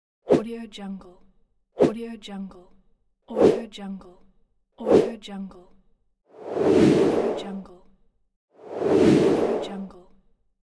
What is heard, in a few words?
whoosh